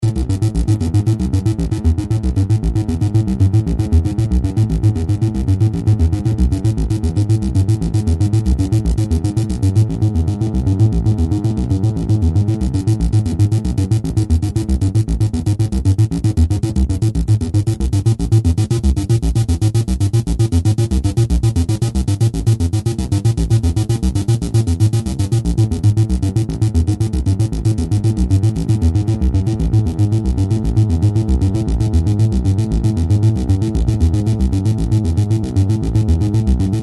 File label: analog synthesizers sequencers; dr zee workshop